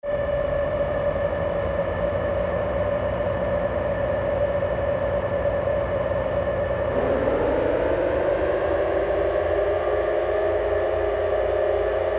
Analog Horror, pads; diva; revealed diva underworld pads

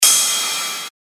Ride, cymbals; revealed cymbals vol 1

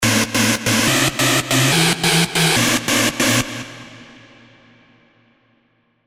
SY Stab, sylenth1 hard techno; Déjà Entendu; revealed sylenth1 hard techno vol 1

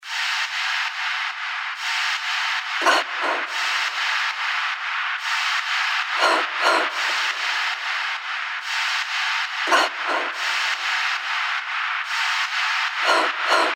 NoiseFX Loop 140 BPM; Oxygen; revealed fx loops vol 2

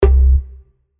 Bass Shot C; synth shots tech house; Bionic; revealed

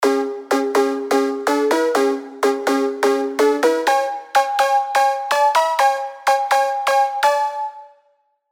serum 2 house stabs; alonso saloon serum 2 house stabs

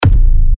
165 A, techno kicks; Coreblaster; revealed techno kicks vol 10

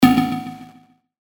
B, percussion; Porygon; revealed percussion vol 2

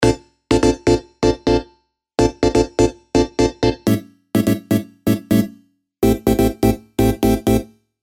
Funk Session, keys; revealed diva keys vol 2

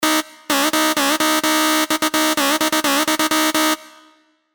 Sylenth1 dirty dutch leads; High Volume; revealed sylenth1 dirty dutch leads vol 1

